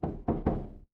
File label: door knock